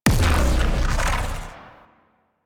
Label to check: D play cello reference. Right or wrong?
Wrong